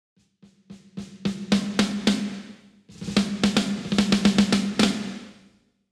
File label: snares; dani loeble impact; klangmacht